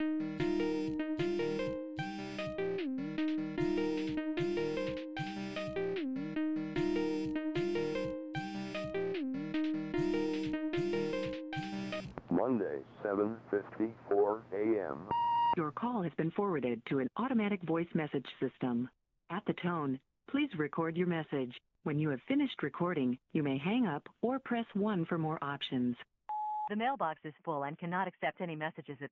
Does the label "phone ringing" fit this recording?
Yes